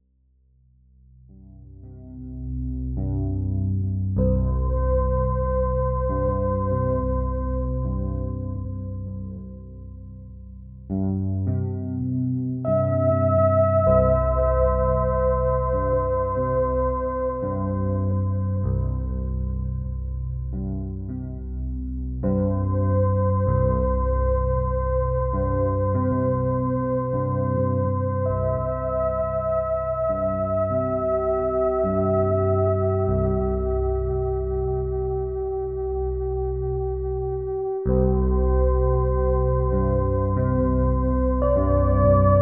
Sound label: 432 hz